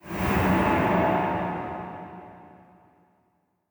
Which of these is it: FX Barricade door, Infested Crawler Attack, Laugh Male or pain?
FX Barricade door